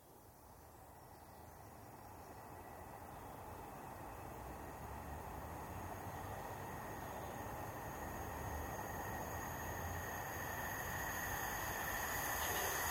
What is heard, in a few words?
Electric Commuter Train, high speed pass right to left; Trains; BBC